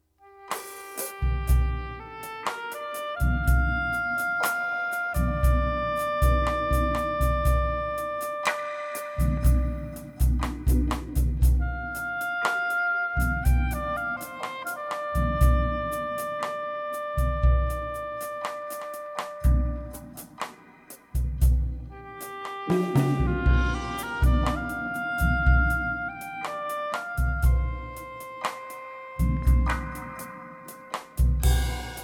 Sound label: oboe solo drums